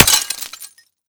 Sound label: glass, bullet collide; STALKER; IWP